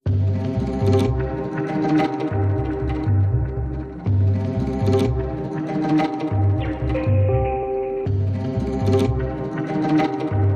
Beatless

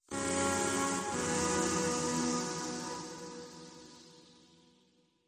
Emota Sting

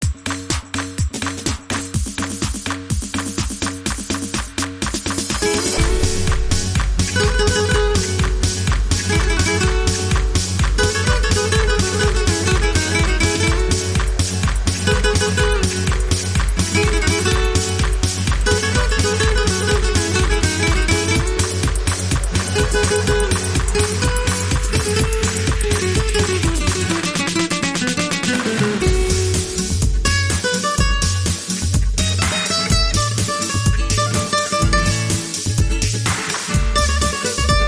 Flamenco Fusion

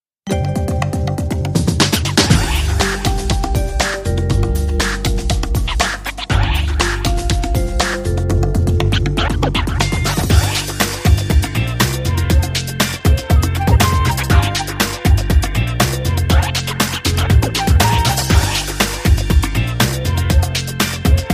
Scratch Hammer

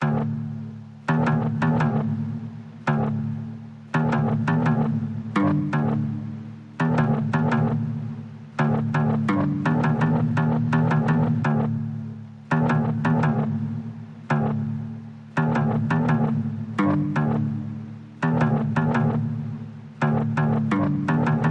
Keys Only

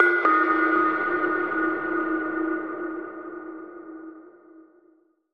Link Sting

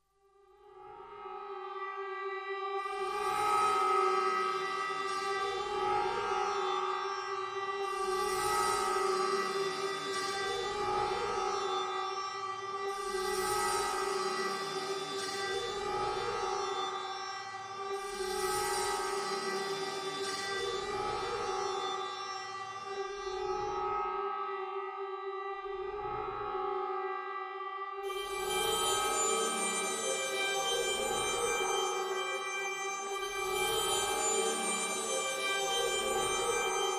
FX Drone

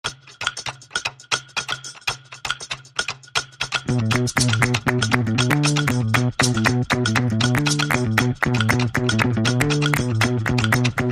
No Horns

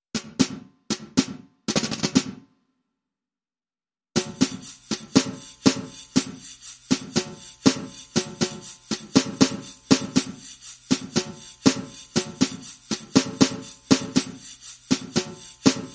Drums Only

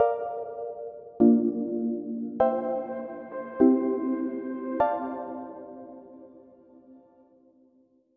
Sting

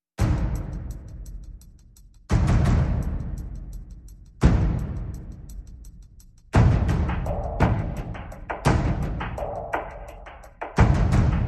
Perc Only

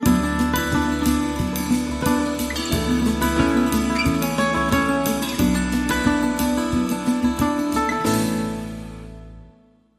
Dreamy Landscape Sting

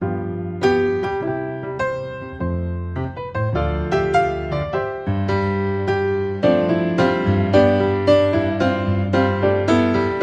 The Twelve Days of Christmas Upbeat; Trad (PD)

